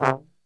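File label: C 1 trombone shot, Brass; Best Service; Brass Super Section; TRMB SHOT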